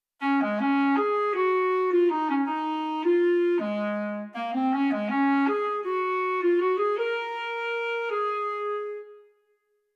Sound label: tonguing